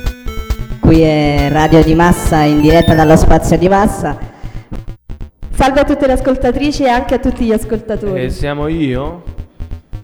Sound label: jingle; radio di massa